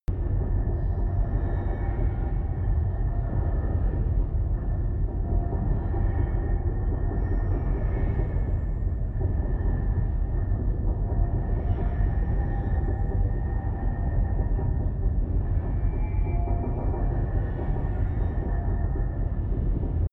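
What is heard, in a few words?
spaceship ambience loop, science fiction; atmosphaeren umwelt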